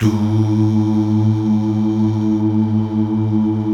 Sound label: Mal Duh; Best Service Gigapack 1